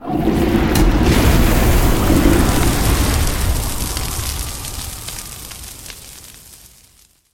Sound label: Gold Dragon Breath Weapon Fire; SyrinScape; Vault of Dragons